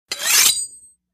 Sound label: Ancient Sword Draw